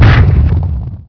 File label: Wall impact